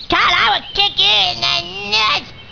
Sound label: kick